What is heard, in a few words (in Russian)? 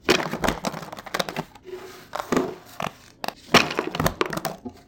karti peremeshani v kolode